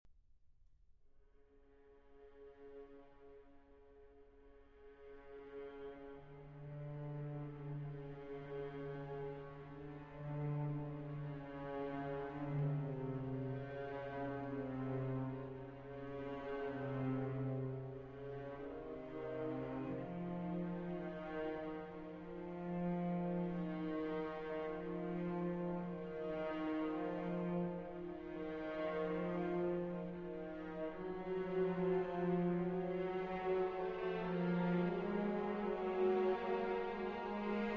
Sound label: Andante For Strings; Crawford Seeger